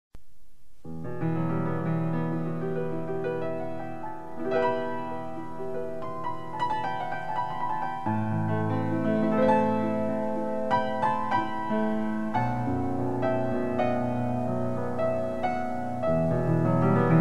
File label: (Piano)